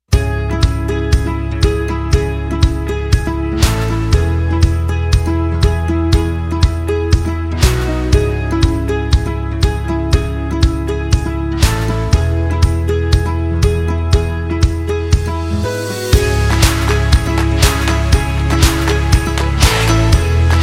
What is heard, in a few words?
Corporate music